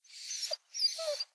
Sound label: Dog; blue archive